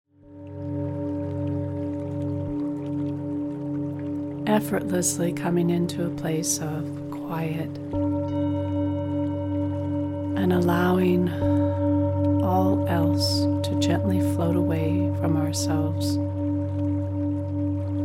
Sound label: Tibetan Bowls Sound Healing